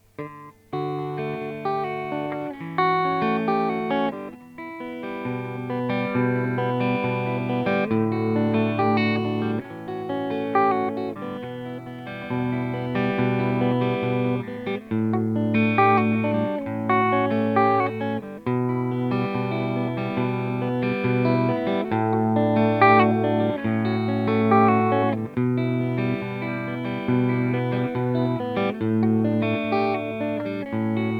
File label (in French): clean G1 PP micro manche P90